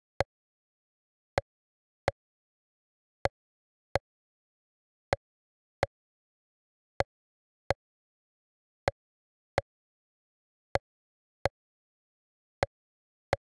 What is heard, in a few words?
perc, Key E Bpm 128; Big Room House; Loop Nerds; Big Room House Allstarz